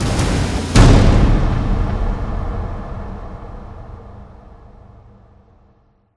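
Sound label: sting power drum fill hit breath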